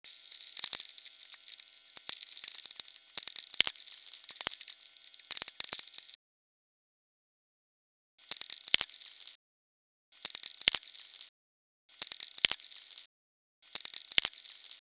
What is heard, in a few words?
Anomalous VLF ELF capture